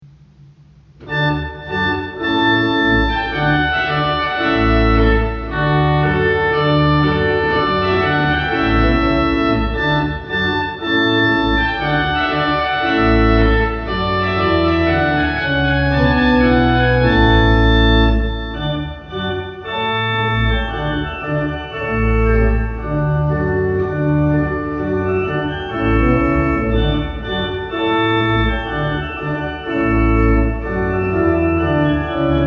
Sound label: Trumpet Tune; Purcell